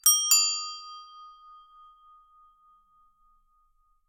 Hand Bell Metallic Bell; BELLHand; Mechanical Wave; Sound Effects Collection

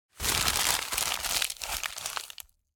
Crumpling an A4 sheet

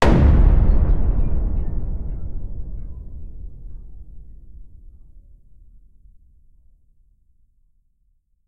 Impact, Trailer Tools; Async Audio

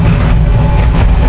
heavy move; hl2

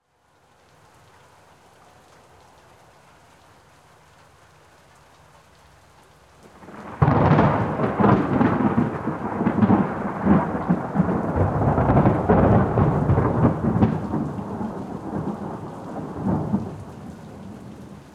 Thunder Crack; Soundopolis; Natures Fury

